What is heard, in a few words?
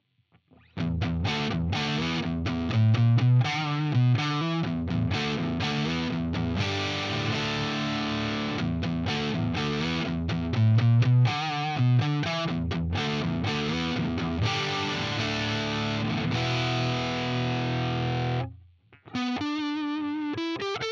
Epiphone ES335 DIST neck